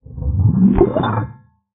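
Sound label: glissando, special fx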